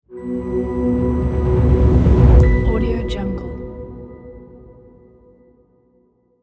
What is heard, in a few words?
powerful atmospheric logo reveal